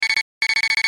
SF Scan Robot

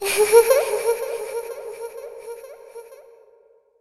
Laugh Ghost Echo

